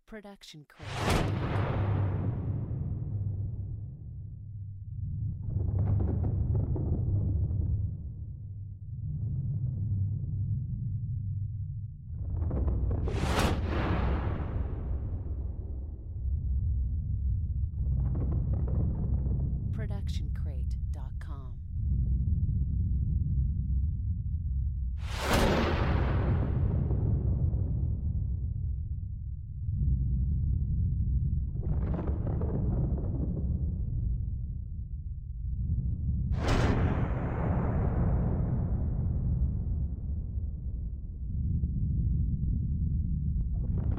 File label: Thunder and Lightning; soundscrate